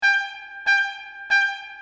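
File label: trumpet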